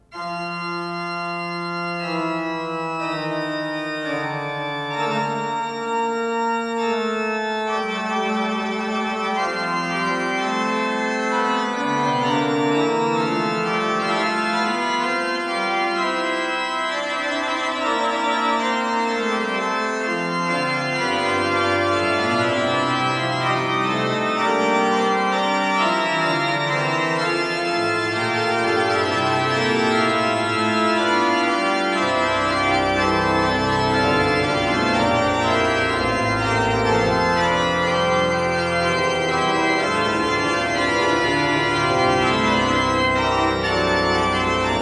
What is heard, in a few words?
Fugue in F major, Bach Great Organ Works; Toccata Fugue in F major, BWV540 - II. Fugue; Johann Sebastian Bach